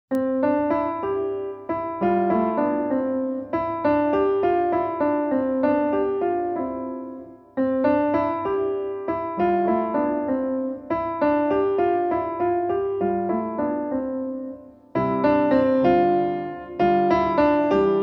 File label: Mouses or Meese piano